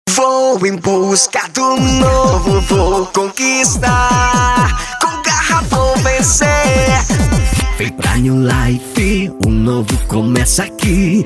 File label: Jingle